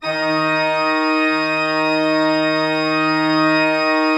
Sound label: D3, Cathedral Organ; ILIO; Propeller Islands Cathedral Organ